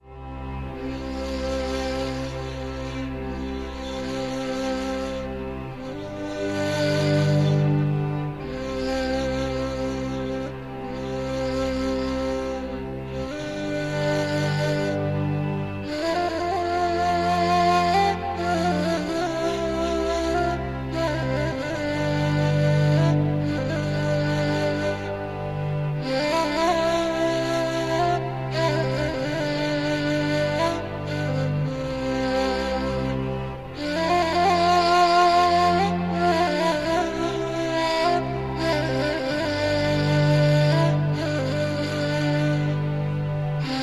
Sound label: Ney